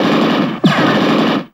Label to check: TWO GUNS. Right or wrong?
Right